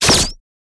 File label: Dash; Go, Kizi, Go!; Dash2 Snd Dash2